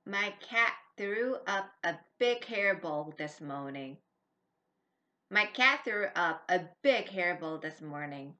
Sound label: throw up